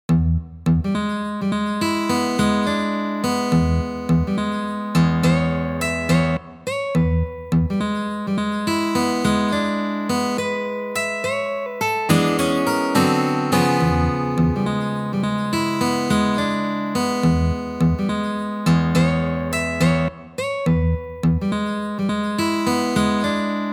Fingerstyle Blues

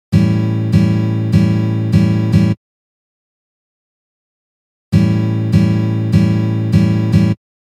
Blues Guitar Strum Pattern

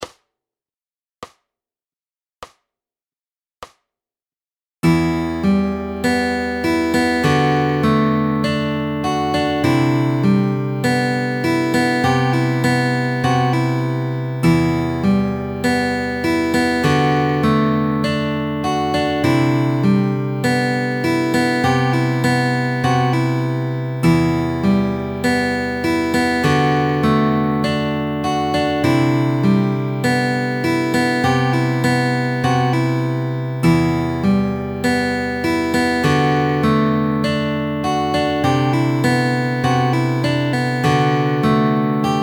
Fingerpicking Fun Solo Jam

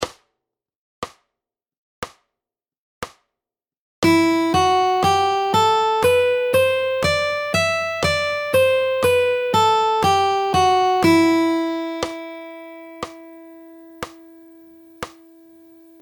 Scale; Fingerstyle Fun; P1 Getting Started

